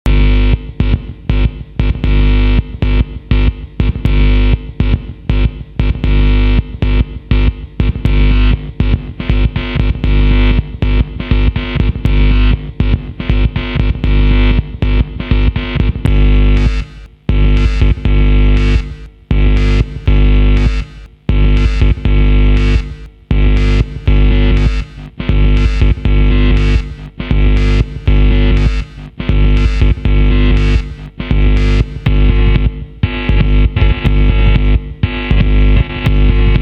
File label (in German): klinke